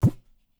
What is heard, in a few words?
tabla; bahia; Dirt-Samples